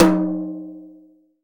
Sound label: TOM, CTD TOM BRSH